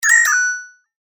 Multimedia Notify, Technology, Multimedia, Notification, Element; Alert Sound Effects; BlastWave